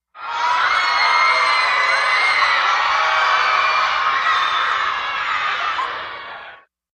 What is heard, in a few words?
Theater Crowd Scream